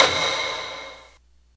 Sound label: taiko normal hit whistle; cedru vax amas